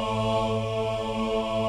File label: Choir